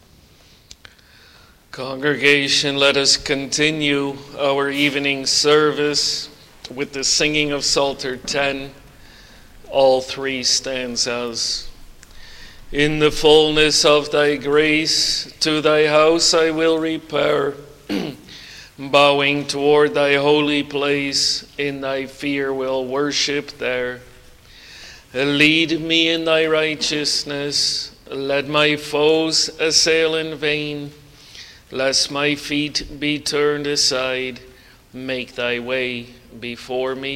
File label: Singing